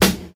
Loud Snare G Key, acoustic snare sample tuned to the G note. Loudest frequency: 2817Hz; snare drum samples; drum single hits one shots